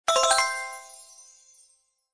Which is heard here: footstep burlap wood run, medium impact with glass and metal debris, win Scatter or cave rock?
win Scatter